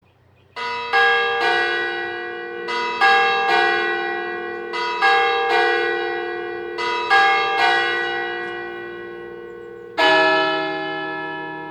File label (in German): Schlag Reformierte Pfarrkirche, kirche; St. Blasius Ziefen